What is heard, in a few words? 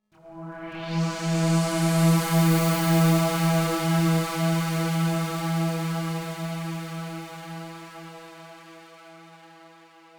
Oneshot Synth; Zombie Army 4 Dead War